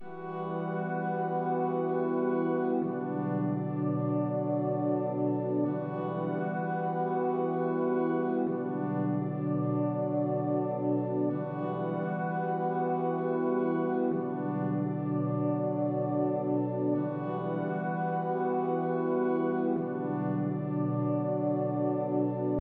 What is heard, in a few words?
85 BPM B Min Pad; Loop Stems; Cymatics; Potion